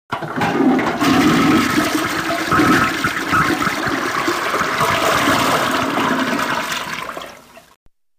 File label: flush